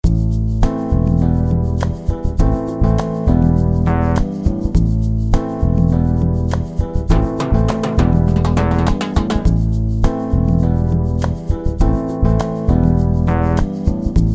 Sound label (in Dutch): begeleidingsbeat; muziek identiteit groep 5 6